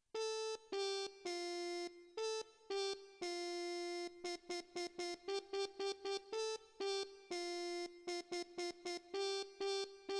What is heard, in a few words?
muted trumpet melody